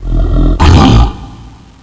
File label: evolution, zombie giant; titan